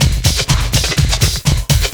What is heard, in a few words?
LOOP 124; Sounds Good - Eurotech